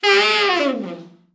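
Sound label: TENOR overblow FALL, Sax; Giga Samples Collection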